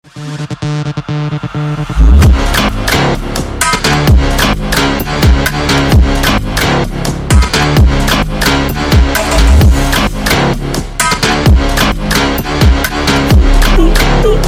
brazilian phonk

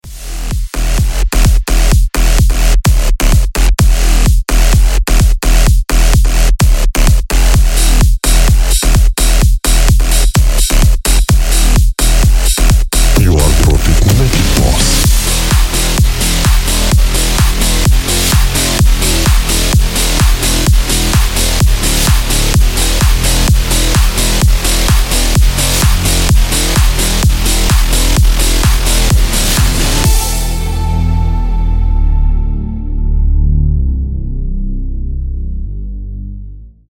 Bass Waves; Клубные; Электроника

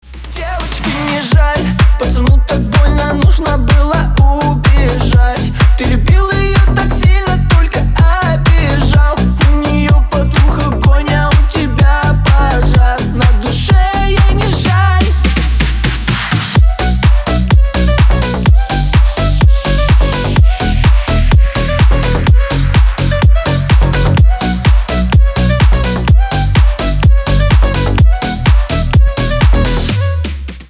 remix

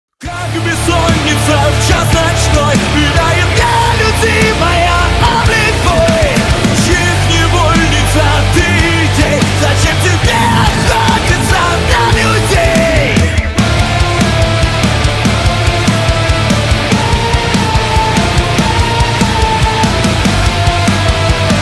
metal cover

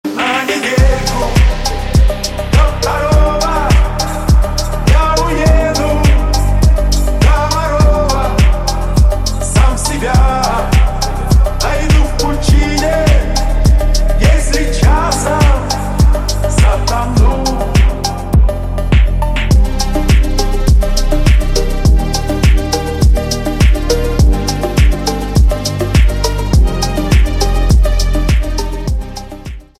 phonk slowed remix; Atomic Heart

